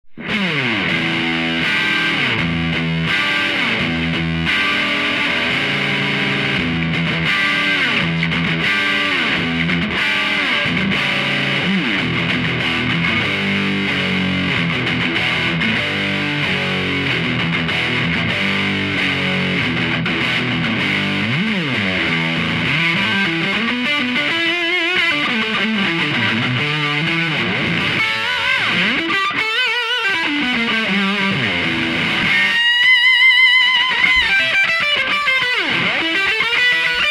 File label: df hum